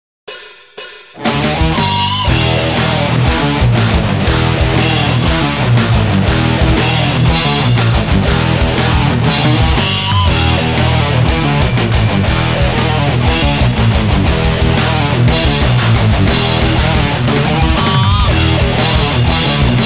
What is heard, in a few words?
groove; Weekday Grooves